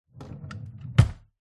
household wooden bed side table drawer close modern metal runners